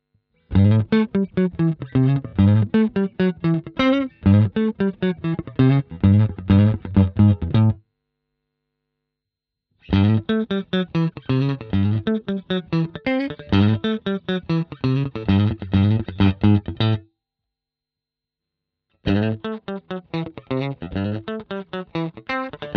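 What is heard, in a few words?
Chapman Groove all positions, guitar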